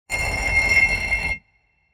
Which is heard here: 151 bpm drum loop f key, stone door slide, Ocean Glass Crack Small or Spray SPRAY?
stone door slide